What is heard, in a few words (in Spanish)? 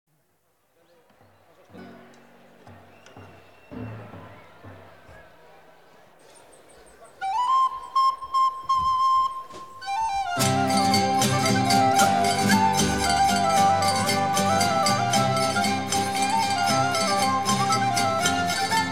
Jota Con Pito (Instrumental)